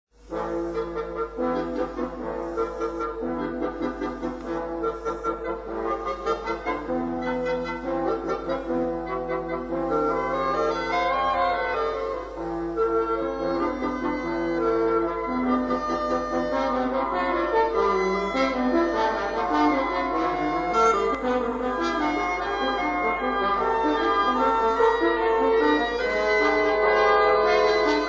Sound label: Toccata